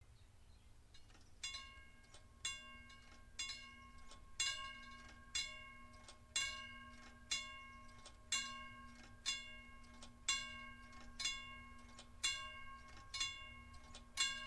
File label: Train Crossing Bell